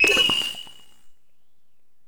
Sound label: ui toggle; Half Life Alyx